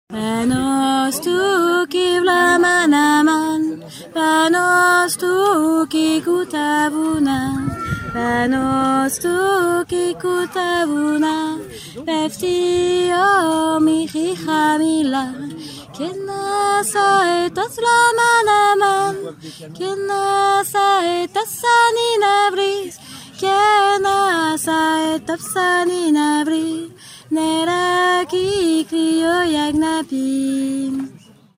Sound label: alto lead; Chanson grecque